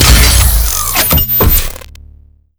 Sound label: gauss sniper fire; doom gauss